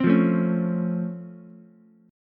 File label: C sharp Augmented Major 7th C sharp down Ukulele, chord; C#+M7; strummed; JGuitar